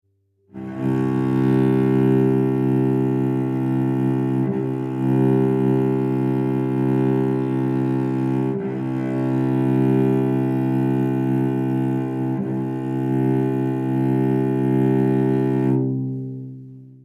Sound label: cello c